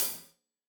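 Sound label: hat closed, hi-hat cymbals stereo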